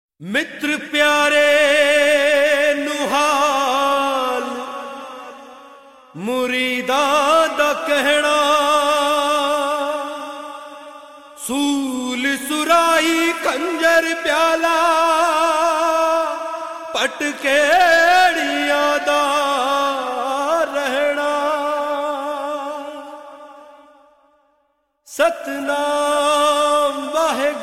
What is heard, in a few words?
Gurbani